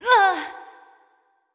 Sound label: Prone; Going Under; Jackie